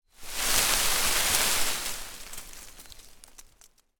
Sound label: tree small shake; foley; zapsplat